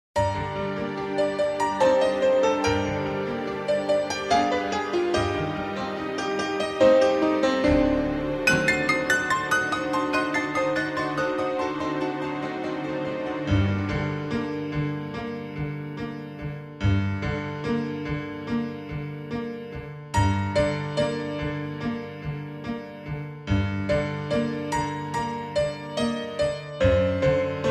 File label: brass minus